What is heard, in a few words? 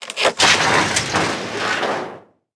metal fence; World of Tanks Blitz